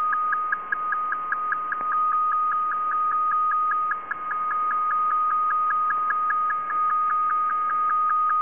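Romanian interior ministry 115.76 Bd FSK system IDLE; ROU-MIL FEC 115.76 Bd in idle condition; FSK-systems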